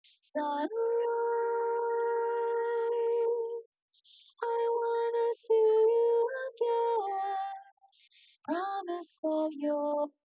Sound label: wdrums; SiSEC10; underdetermined